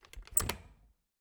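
Refrigerator, old fashioned, wooden, steel, brass clasps, hinges, close, soft; Eiravaein Works; Vaeyan IV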